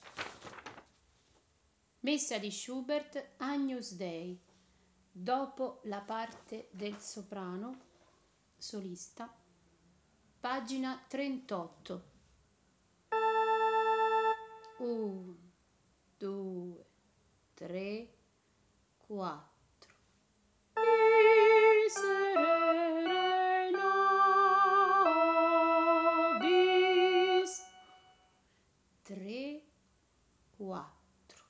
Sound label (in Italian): agnus soprani; Schubert